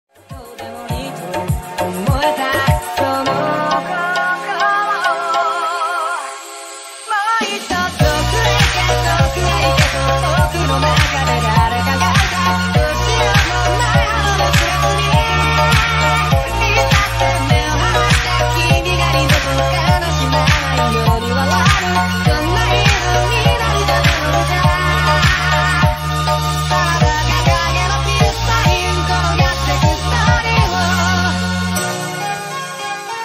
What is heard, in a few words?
Ringtone, Anime opening ringtone; My Hero Academia